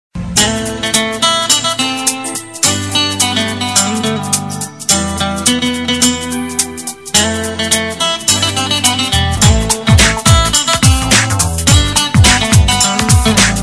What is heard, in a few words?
romantic instrumental ringtone